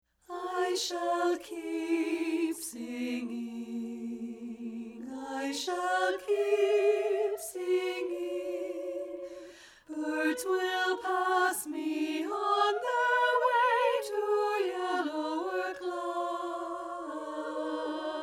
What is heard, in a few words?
ALTO